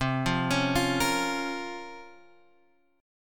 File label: Bb Minor C, Bbm/C Chord; arpeggiated; JGuitar